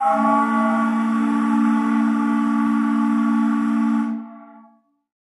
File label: SHIP STEAM WHISTLE; boat horns